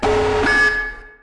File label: notice